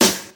2000s short snare sample f key, snare tuned to the F note; snare drum samples